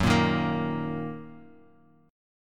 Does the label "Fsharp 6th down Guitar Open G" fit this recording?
Yes